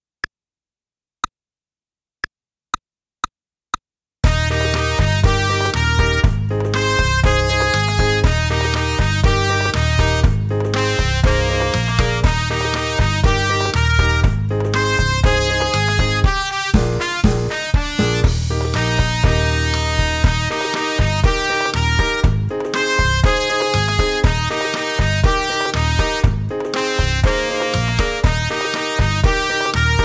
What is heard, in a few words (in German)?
Melodie, trumpet; trumpet fun vol 1